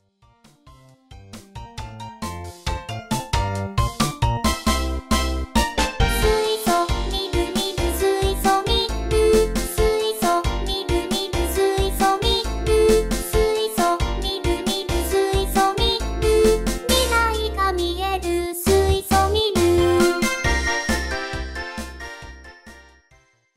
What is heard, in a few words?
chorus